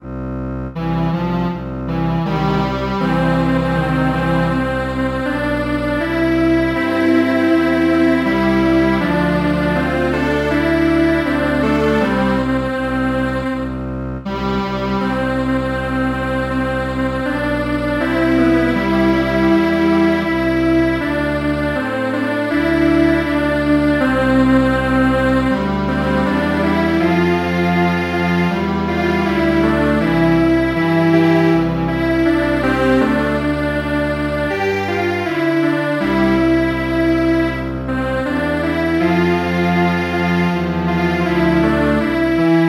Strings Quartet

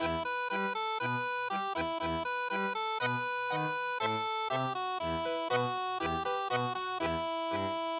tango; amenoniwa

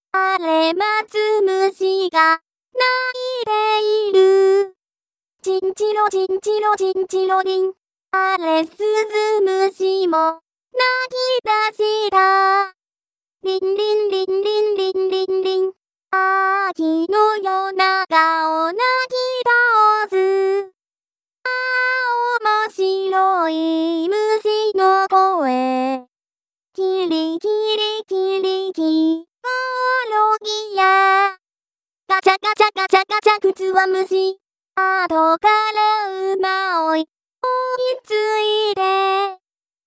vocal

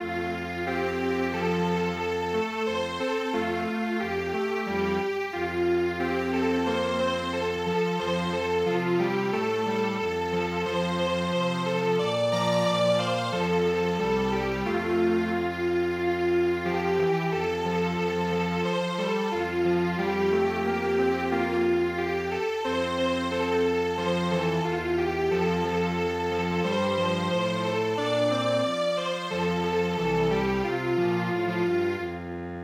Strings Quartet

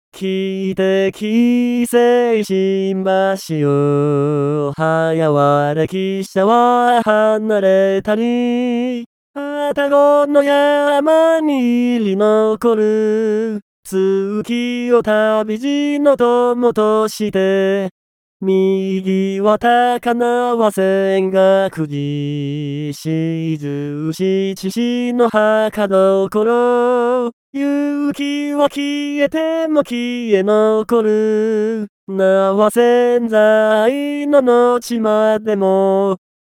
Tetsudou Shoka, vocal only

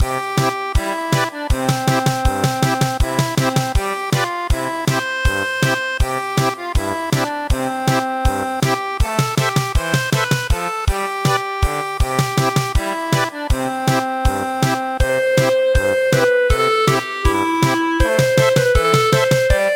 German Polka

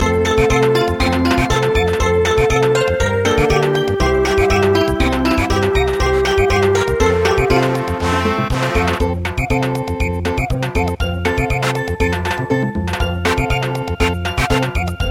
Samba

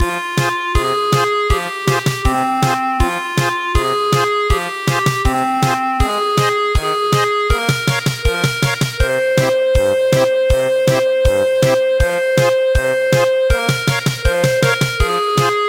German Polka; Armenian lullaby

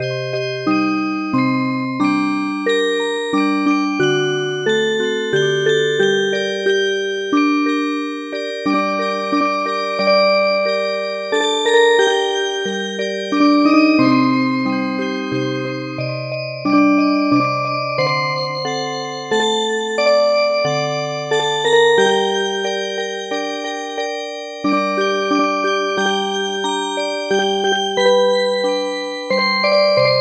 Music Box; Dandansoy

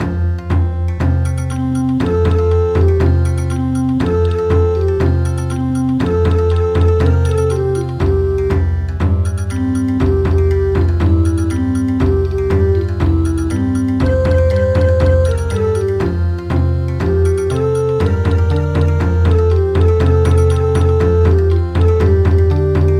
Bon Odori

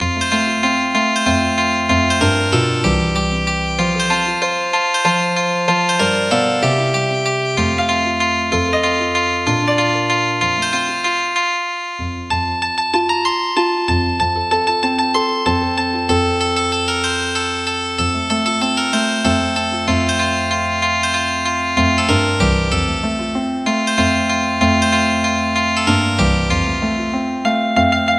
Healin Harp

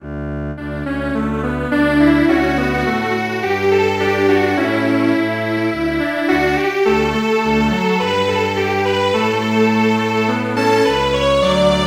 Strings Quartet; Lullaby

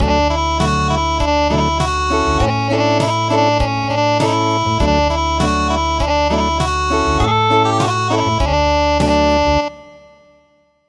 Acoustic

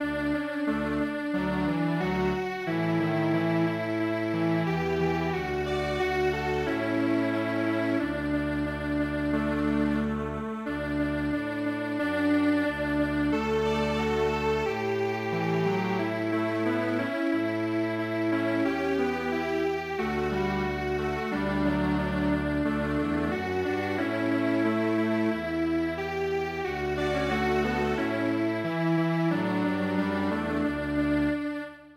Strings Quartet; AchaPachaNocha

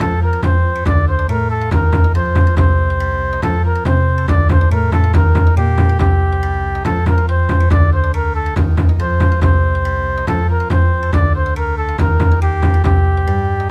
Bon Odori; Lorig

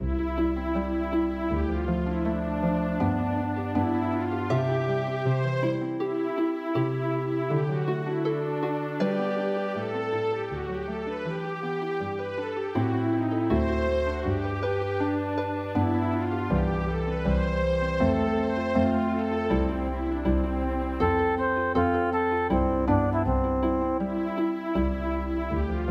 Goddess Harp; Heidenroslein; Schubert

